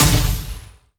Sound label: hit left; junisaber